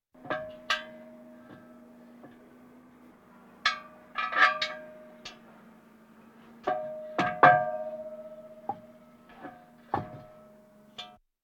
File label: Military vehicles